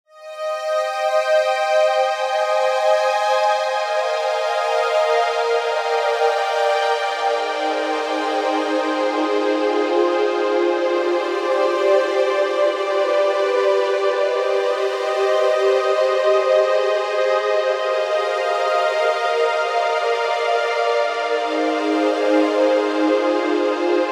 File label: synth wet